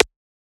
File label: Percussion; FLPRO